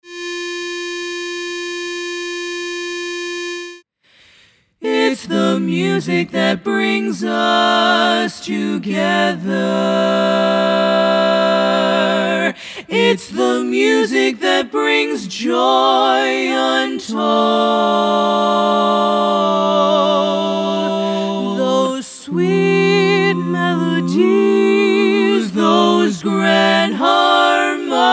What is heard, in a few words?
Learning Track Tenor Missing